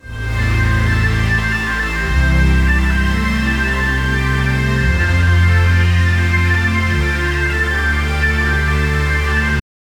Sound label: some fantasy instrumental; main menu